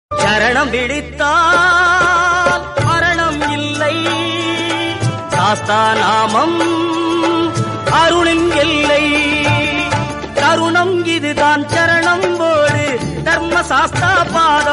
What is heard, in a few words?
ayyappa swamy devotional ringtone; Swami Saranam Ayyappa